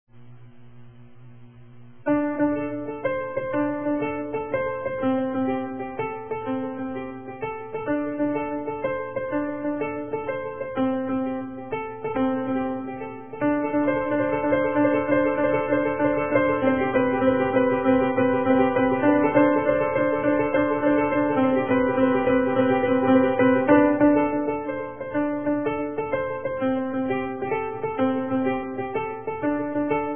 keyboard

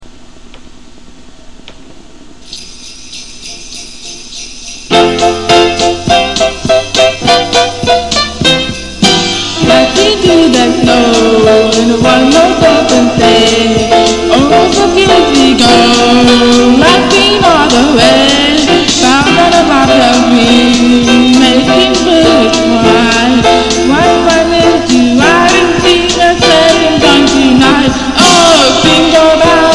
Jingle Bells

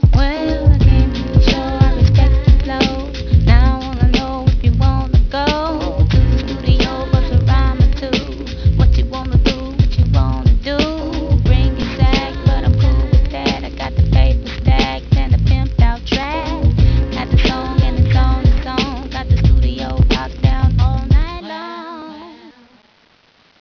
Rap

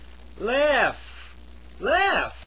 laugh

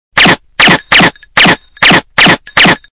pp7 silenced

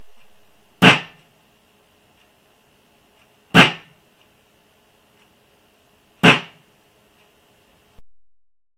taps

